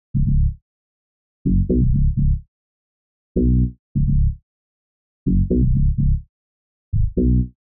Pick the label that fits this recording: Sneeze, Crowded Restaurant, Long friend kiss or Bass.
Bass